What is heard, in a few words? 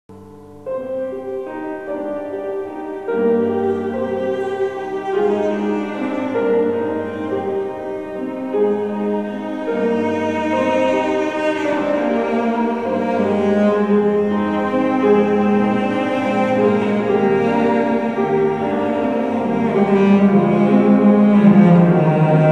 Tango; Argentino